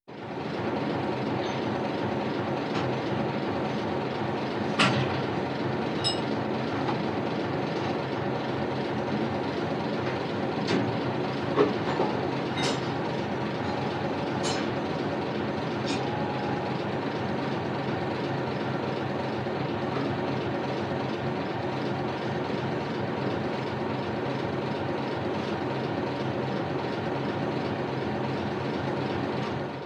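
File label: Fishing boat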